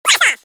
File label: blastoff